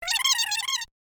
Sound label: Chipmunk Scratch Joy